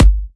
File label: Electro Kicks; Vengeance - Essential Clubsounds Vol.5; Bassdrums